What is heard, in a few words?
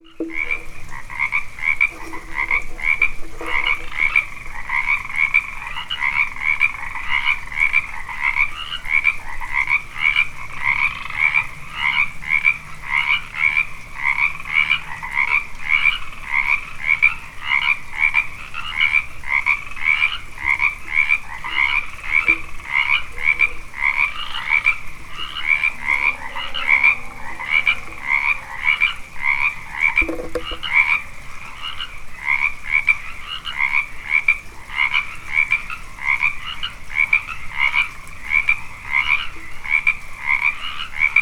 Frogs